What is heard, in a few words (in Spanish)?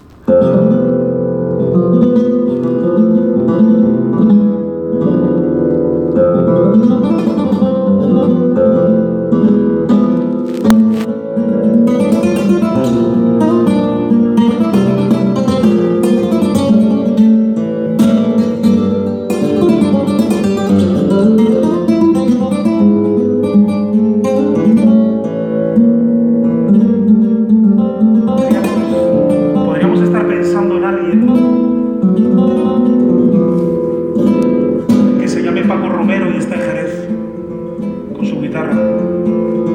Guitarra